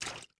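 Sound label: water run right, footsteps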